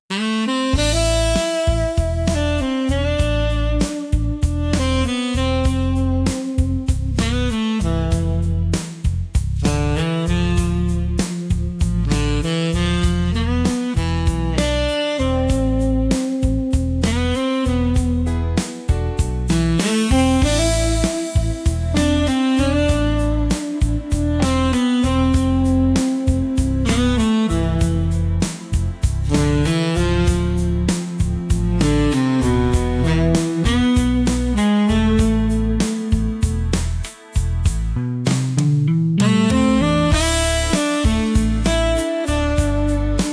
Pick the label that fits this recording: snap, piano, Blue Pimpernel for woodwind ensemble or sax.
sax